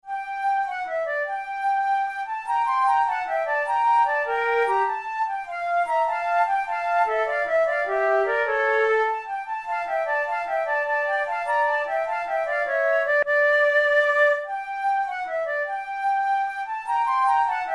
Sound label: gigue, flute